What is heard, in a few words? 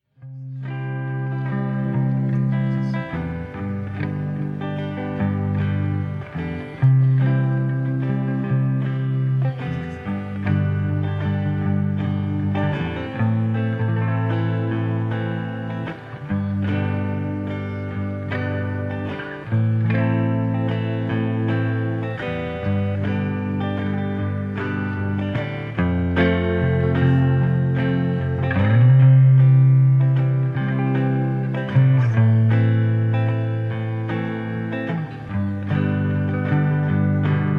instrumental